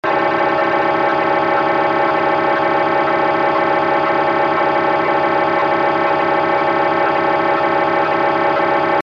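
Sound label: EDACS base channel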